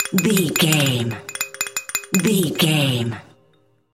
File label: toy bells kids bath chime jingle